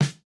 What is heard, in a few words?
no effect j city pop 1980 snare